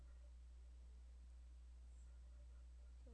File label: shut